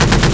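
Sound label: helicopter machine gun loop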